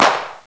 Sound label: shoot, paintball; paintballav